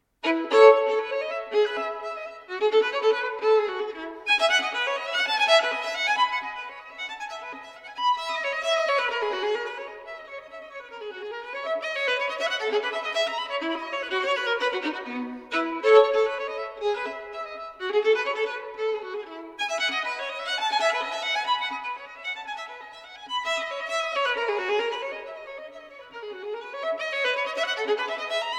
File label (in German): partita III e dur bourre; bwv 1006